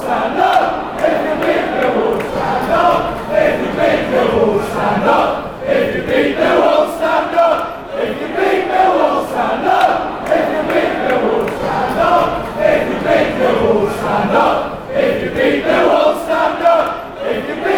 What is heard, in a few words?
stand up if you beat millwall, chants